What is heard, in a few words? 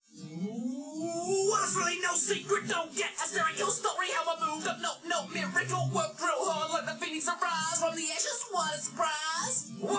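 with drums; SiSEC10; underdetermined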